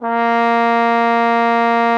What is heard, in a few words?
A#3, TROMBONE; Xsample VOL-3